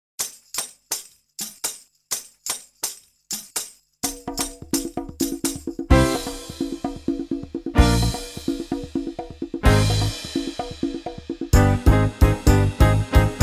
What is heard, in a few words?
Shake That Tambourine